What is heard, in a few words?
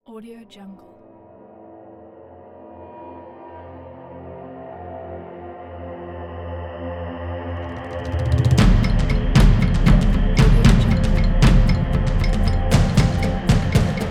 tribal percussions